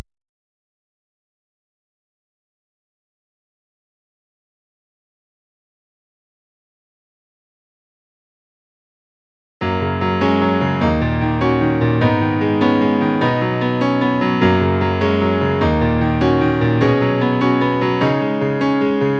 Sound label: The Scenic Piano